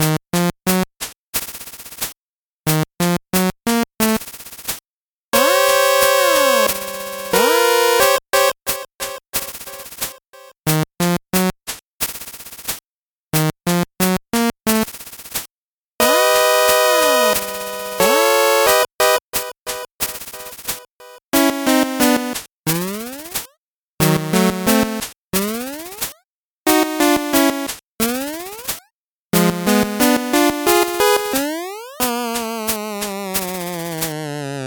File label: 8bit bgm slow